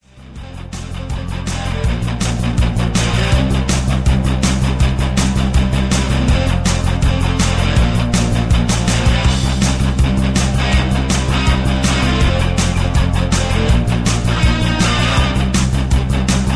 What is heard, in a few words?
Karaoke Mp3 Backing Track